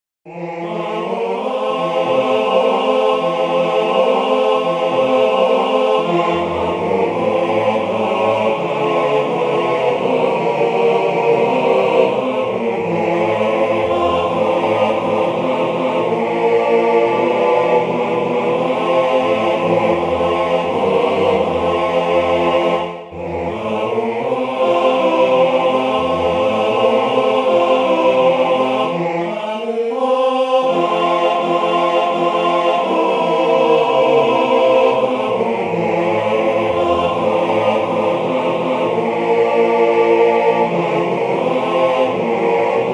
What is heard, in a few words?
when the saints come marching in ttbb, Male Voice Choir; Arrangements for Male Voice Choirs